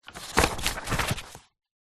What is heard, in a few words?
office paper construction sugar page turn book; zapsplat